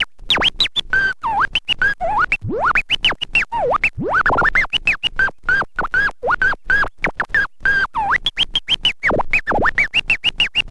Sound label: scratch long, Vinyl Attack, scratches; Masterbits; scratches mix